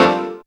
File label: syn a, Dance Synths Stabs & Hooks; Best Service ProSamples vol.37